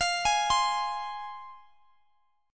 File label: F Minor, down, Guitar, Open G